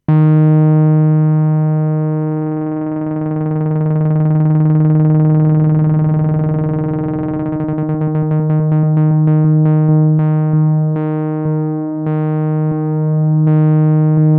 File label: PERfourMER LFO